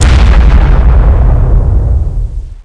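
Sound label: large, impact explosion; SINDEMO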